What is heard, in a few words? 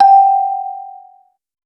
a short descending marimb